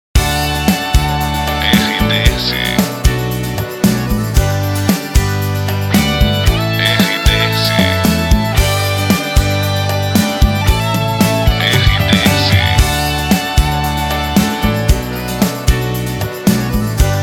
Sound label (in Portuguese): trilha para locução raízes sertaneja